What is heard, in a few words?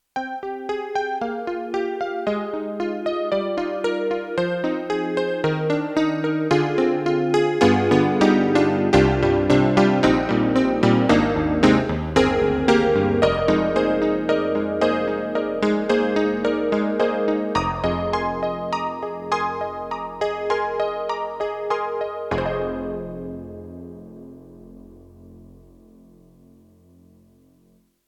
Yamaha MU1000EX Det CP80